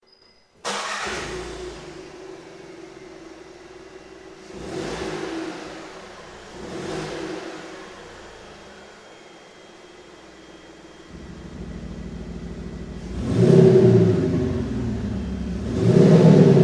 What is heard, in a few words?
Sound Booster VW Polo PRO Version; KUFATEC